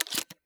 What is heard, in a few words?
sawedoff insert shell; weapons; Counter Strike Model Pack; CS2